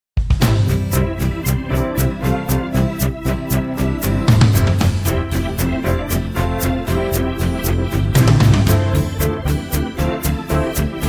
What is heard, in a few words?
chorsound vocals; holy holy holy